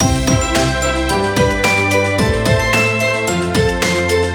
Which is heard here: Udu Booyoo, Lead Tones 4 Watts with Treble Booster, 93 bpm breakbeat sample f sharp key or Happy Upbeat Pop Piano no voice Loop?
Happy Upbeat Pop Piano no voice Loop